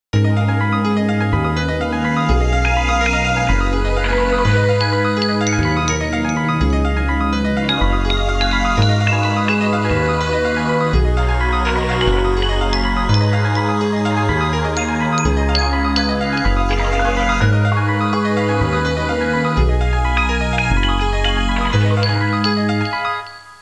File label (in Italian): musica elettronica